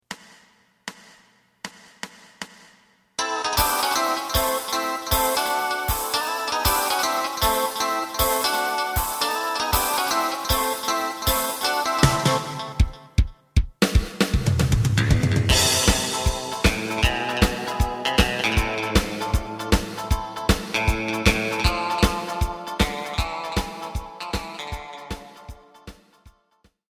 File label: bass